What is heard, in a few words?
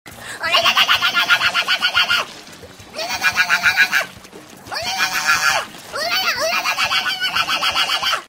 machine gun; miko